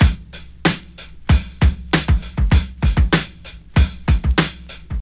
96 bpm 00s breakbeat sample A sharp key, kick tuned to the A# note